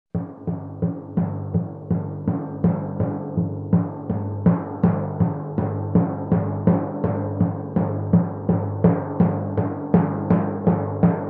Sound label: Timpani straight beat rising intensity